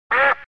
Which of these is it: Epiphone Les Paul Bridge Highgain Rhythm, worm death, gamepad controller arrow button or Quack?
Quack